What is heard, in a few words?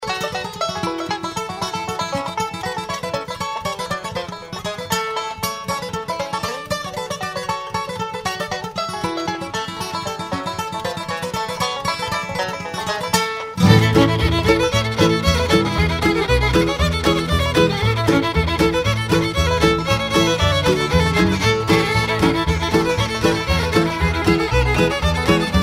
Happy Heart Hornpipe